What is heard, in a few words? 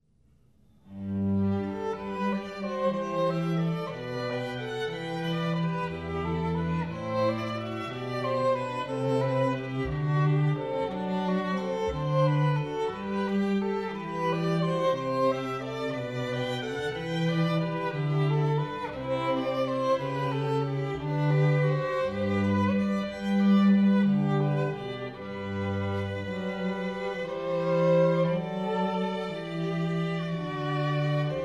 trio; Bach